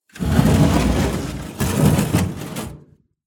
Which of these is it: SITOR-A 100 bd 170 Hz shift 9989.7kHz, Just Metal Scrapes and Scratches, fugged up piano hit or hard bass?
Just Metal Scrapes and Scratches